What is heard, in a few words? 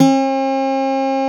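Steel Acoustic Guitar D2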